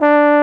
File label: BARITON; Best Service; Alpine Volksmusik